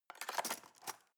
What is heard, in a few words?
cutlery teaspoon drawer scrape